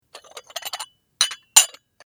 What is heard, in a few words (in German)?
Tasse in Tasse